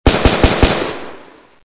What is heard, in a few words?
gshots; egypt; egypt narrative